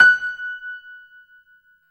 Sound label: PIA MICRO, MICRO-PIANO, Roland; Sound & Vision - Gigapack II